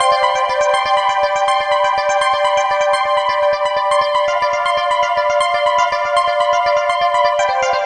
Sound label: Arp, Keyboards